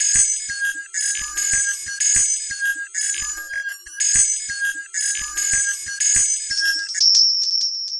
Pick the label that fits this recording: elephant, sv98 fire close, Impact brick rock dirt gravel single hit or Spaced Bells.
Spaced Bells